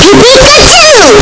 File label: pikachu cry; pokeyellow